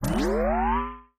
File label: night vision on; factorio 2.0.55